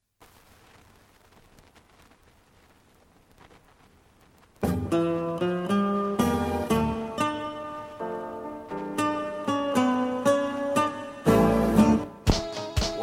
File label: tango allegro